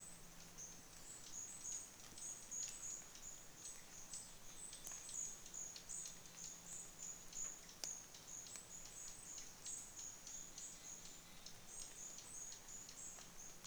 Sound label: forest soundscape